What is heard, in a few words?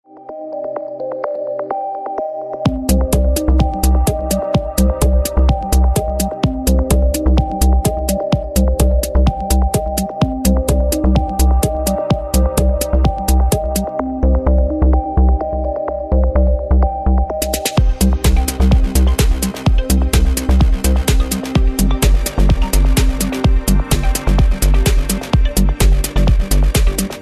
Chilled Beats